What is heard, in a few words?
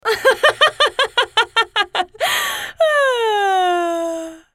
laugh; JannaForecast